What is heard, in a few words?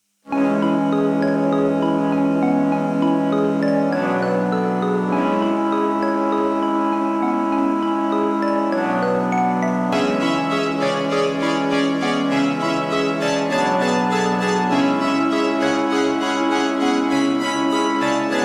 Yamaha Boss Fight, videogamemusic instrumental synthesizer 80s